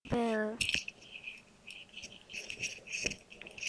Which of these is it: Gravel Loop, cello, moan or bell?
bell